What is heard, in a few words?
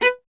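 STR VLA B2, strings viola; Miroslav Vitous; Solo Instruments VOL-1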